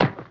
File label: ARROW; DarkOmen